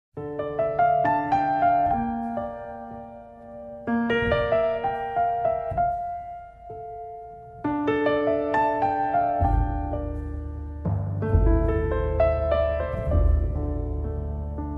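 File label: piano music ringtone; fortepiannaya muzyka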